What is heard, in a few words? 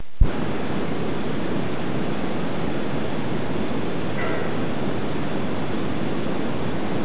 piano